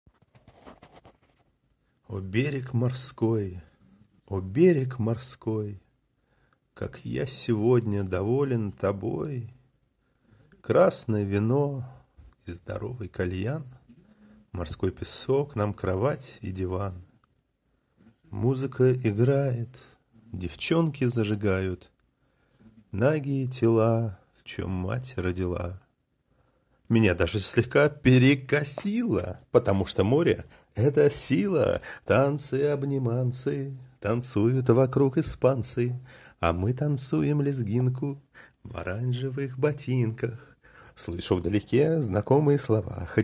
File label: game over